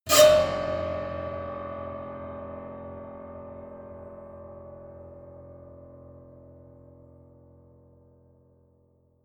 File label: piano squeal